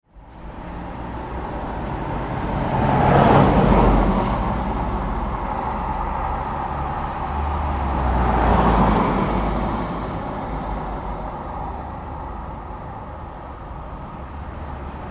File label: Traffic Pass Slow City Street, Variety of vehicles; Variety of vehicles pass close; Traffic And Car